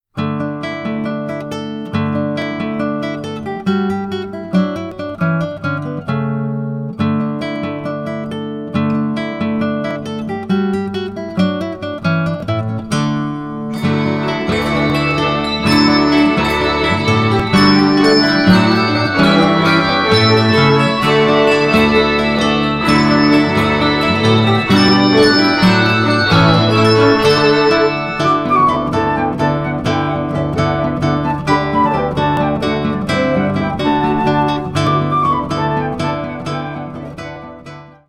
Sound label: Guitar